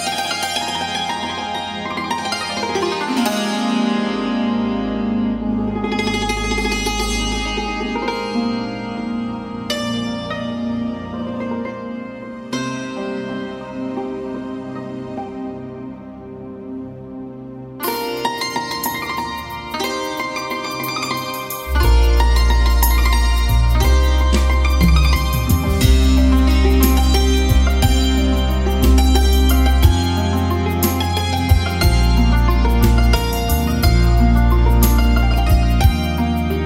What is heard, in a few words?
Santoor